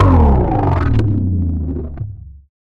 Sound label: Bass Drop; SoundsCrate